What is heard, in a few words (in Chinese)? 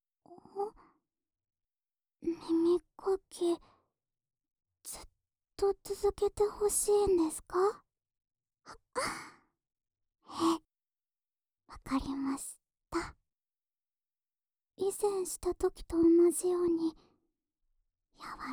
安眠用掏耳（右耳）, ASMR; 耳かきリフレ『春乃撫子』